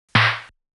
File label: Clap ish